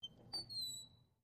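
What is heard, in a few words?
Front Door Hinge Squeak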